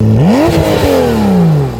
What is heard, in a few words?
menu rev; Chase Hollywood Stunt Driver; XBOX